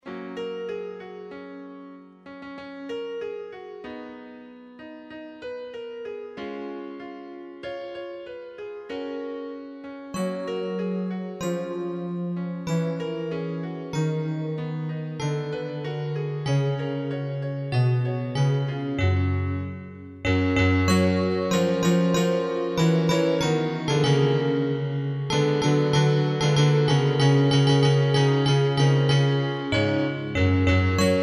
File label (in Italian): jingle basso